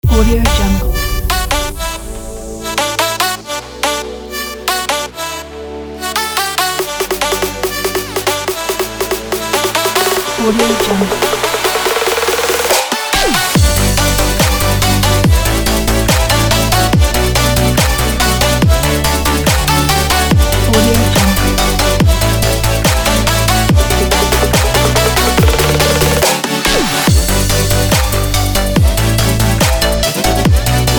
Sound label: upbeat future pop kit